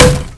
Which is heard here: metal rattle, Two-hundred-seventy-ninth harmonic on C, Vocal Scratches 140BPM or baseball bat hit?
baseball bat hit